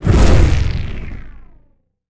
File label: activate; smite; cerberus